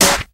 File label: noisy snare one shot f sharp key